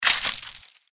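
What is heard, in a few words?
sting movement; npc; cazadore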